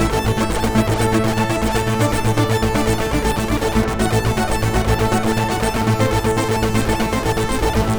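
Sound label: Sequence Pattern, Keyboards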